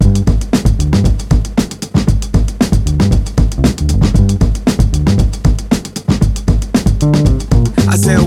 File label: 116 bpm modern drum beat g key, Free drum loop sample - kick tuned to the G note